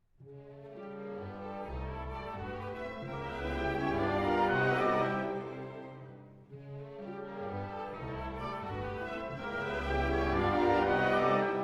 Valses nobles et sentimentales (Version for Orchestra) Assez vif